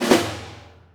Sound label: Snare Roll; ARMS